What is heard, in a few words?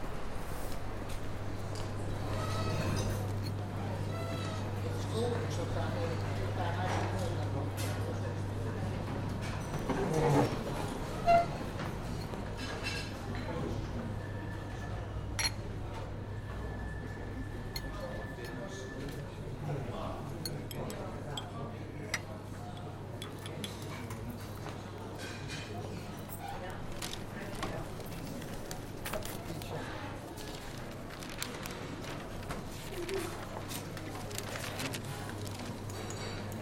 Breakfasting In The Coffeehouse; Gerbeaud